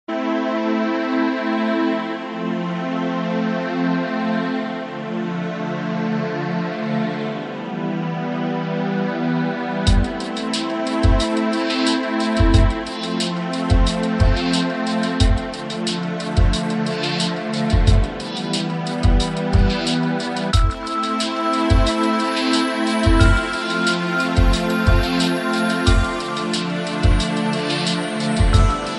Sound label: Ambient Chillout; Ringtones